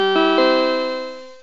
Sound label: gong; metro